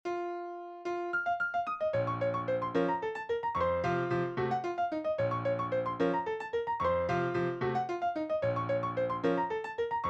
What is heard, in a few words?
la campanella piano